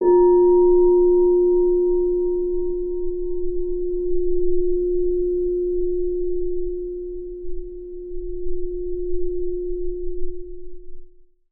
heart bowl; ringtones; dustinwells

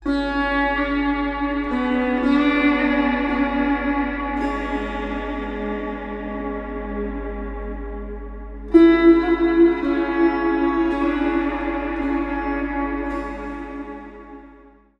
sitar phrygian, ringtones; dustinwells

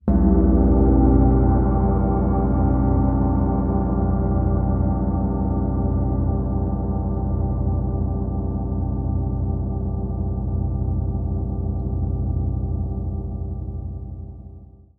cymbal gong, ringtones; dustinwells

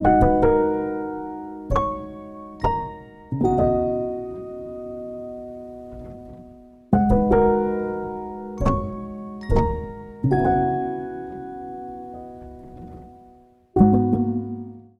still piano; ringtones; dustinwells

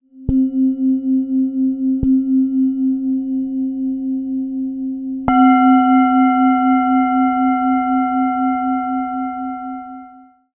bowls, ringtones; dustinwells

